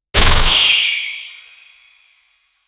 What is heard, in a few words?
katana weapons swing; blade